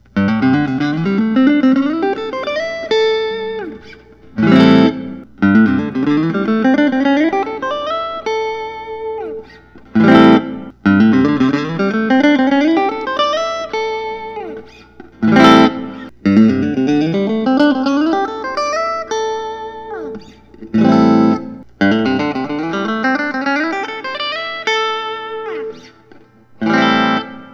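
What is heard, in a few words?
fender usa american vintage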